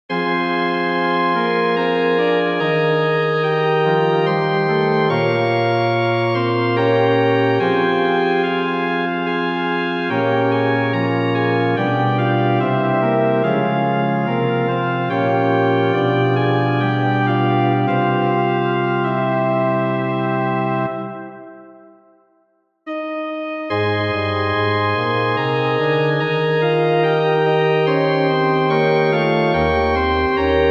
prelude in f minor

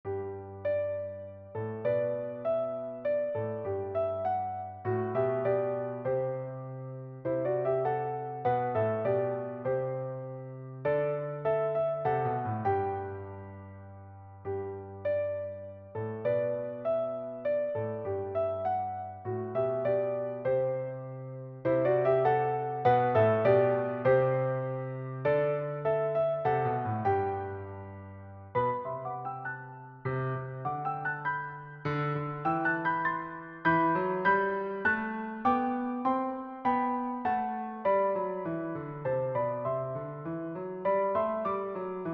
Lullaby G Major, lullabies for piano; 4 lullabies for piano

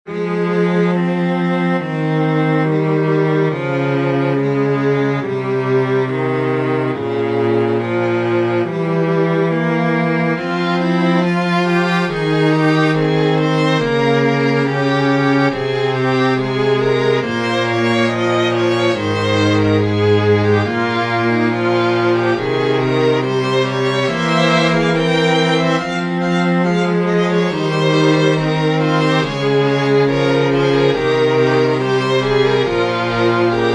F minor Piece for String Quartet

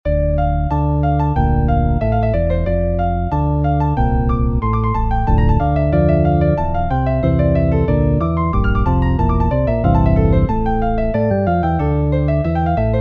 harmonic variation of celebration march

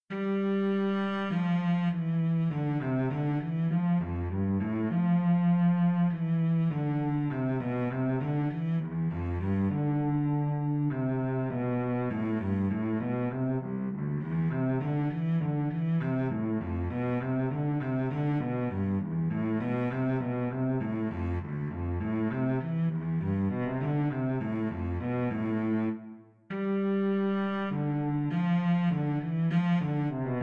Soliloquy for Violoncello

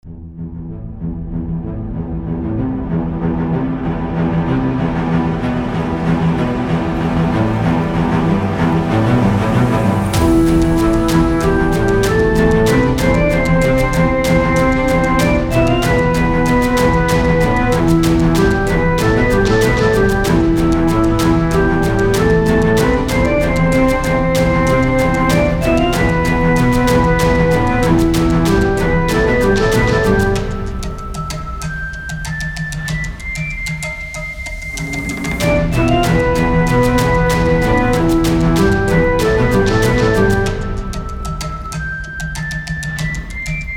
Menu

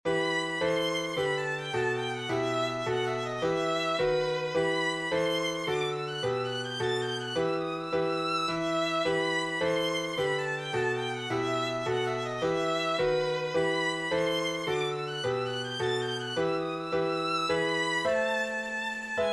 Tarantella; from Italian Dances

